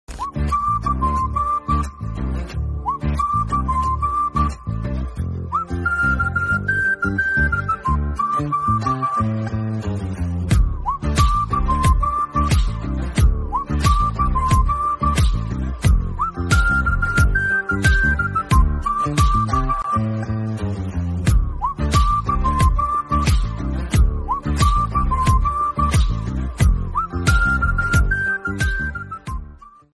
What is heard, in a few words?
tamil love song; hrudhayam